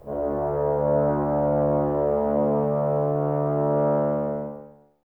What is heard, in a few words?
BRS TBS D, Woodwind Brass Ensemble, Brass; Miroslav Vitous - Woodwind Brass Ensemble